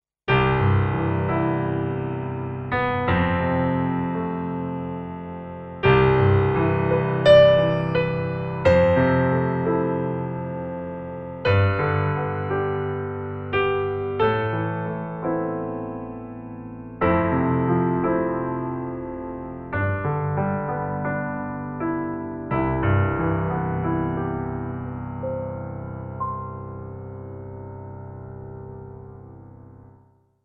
Ketron SD 90 Grand Piano

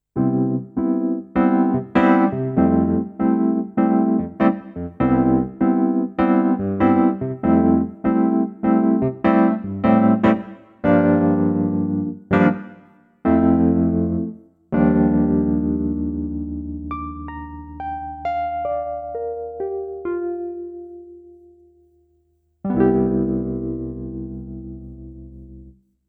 PP 3 E Piano; Gewa

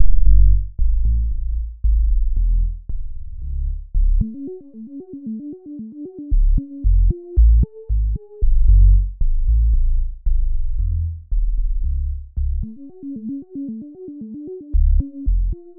Repro5; Messerindustrie

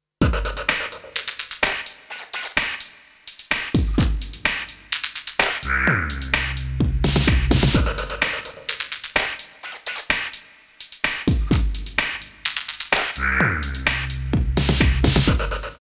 tempest doubler room; DRP-20

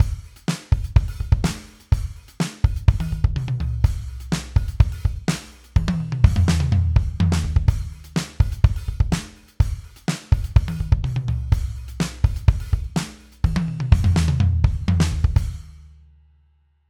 Drums Oldie; Steven Slate Drums 5

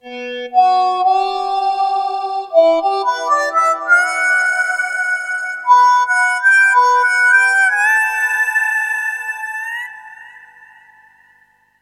Harmonica

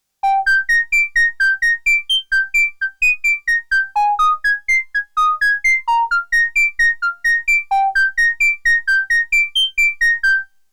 Marion Systems MSR2 High Notes

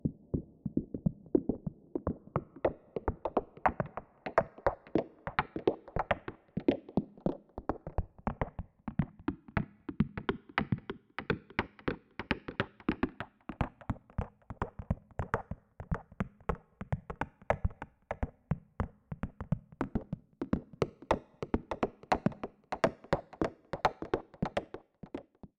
Percussion

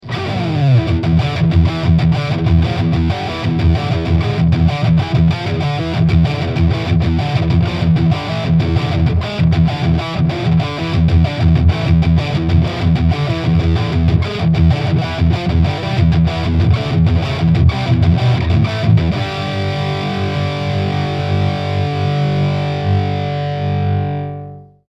Lead Red Box

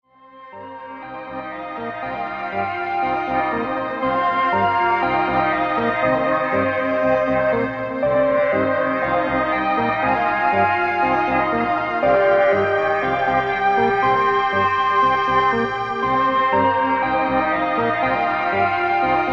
M-Tron Pro Strings Flute E-Bow

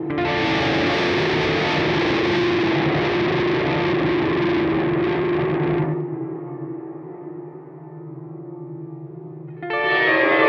Red Panda Context V2 Plate; Nightmare

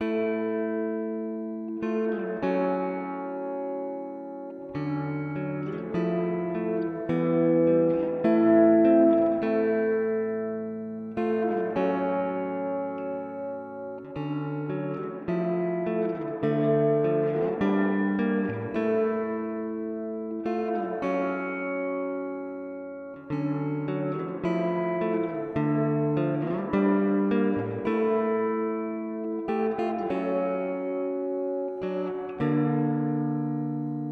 Plate; Walrus Audio R1

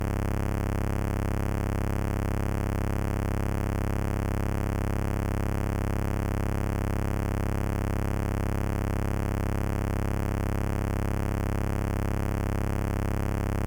Stylophone S2 Sawtooth Subosc Sub Subosc; Dubreq

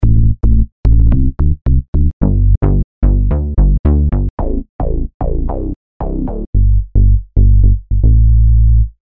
Bass Mix

